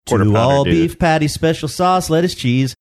singing two all beef patties

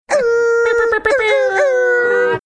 making air horn sounds

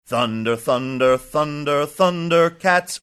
singing thunder thunder thunder

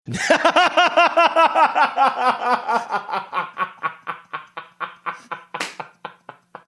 awesome laugh

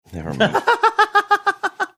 saying never mind laughing

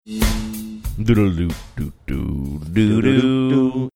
scatting along blursday music

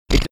snorting

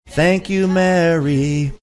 singing thank you mary

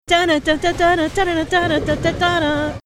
singing part of

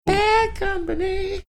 quietly singing

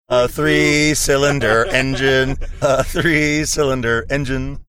singing a 3 cylinder engine

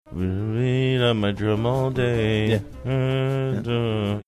mumble singing bang drum; hold music

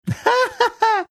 cute laugh

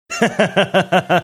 chucking

